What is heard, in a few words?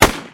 ak47 shot